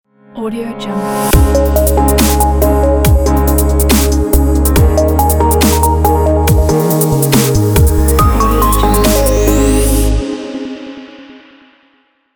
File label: modern ident